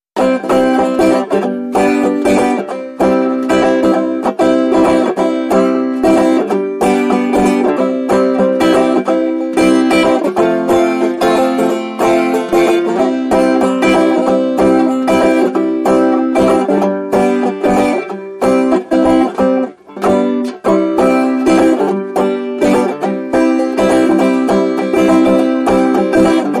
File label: Happy Corn Banjo Only